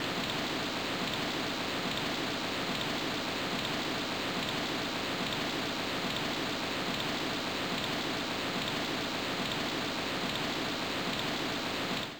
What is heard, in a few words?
Ambience; Fisherman's Bait: A Bass Challenge; PS1